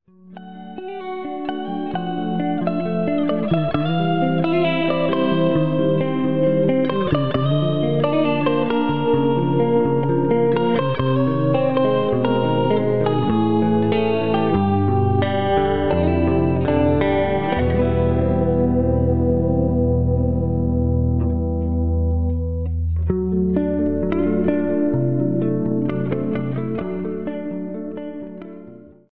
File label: Soft Rains; Hear In The Forest